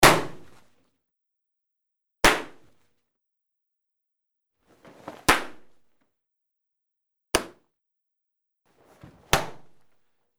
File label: karate kicks to pad